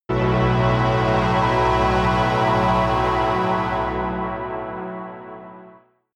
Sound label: synth hover